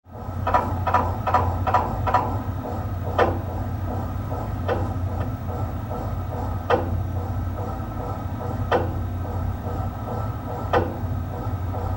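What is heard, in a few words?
MRI tomography sounds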